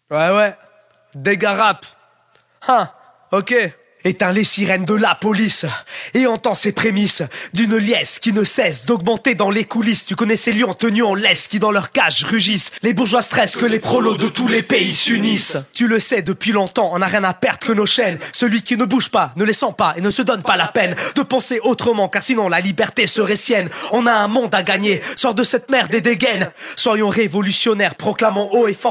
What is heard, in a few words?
Rap hip hop 87 Abm; acapella